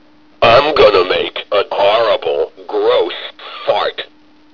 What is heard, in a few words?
fart